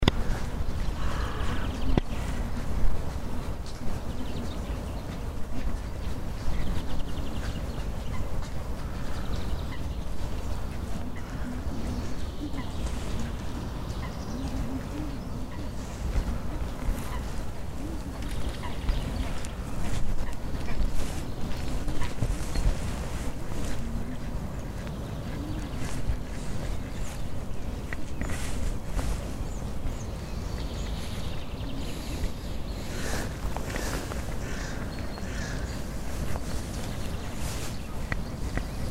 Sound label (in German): seegeraeusche